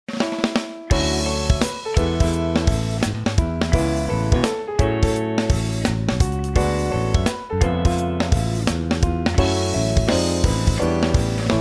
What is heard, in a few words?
Jingle bells